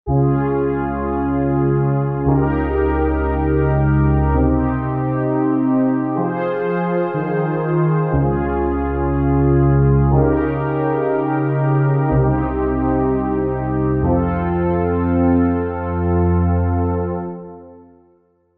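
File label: Oberweich, synth pad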